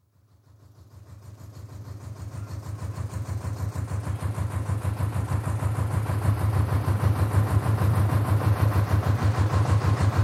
Canal Narrow Boat, manoeuvring (Recorded on shore); Boats; BBC